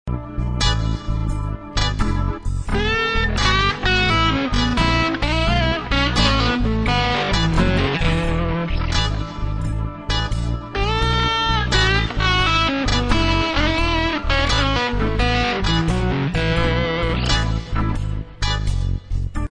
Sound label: blues